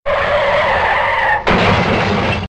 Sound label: Car Crash